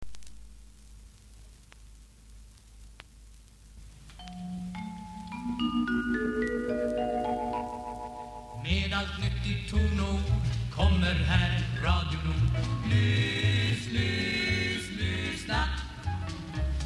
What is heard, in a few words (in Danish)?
jingle velkommen